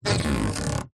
foley plastic sheath metal coil stretched rub squeak; zapsplat